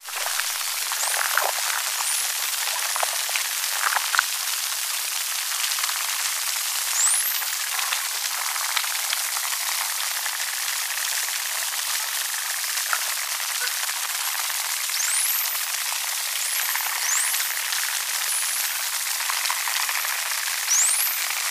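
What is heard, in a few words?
Dolphin Spinner Clicks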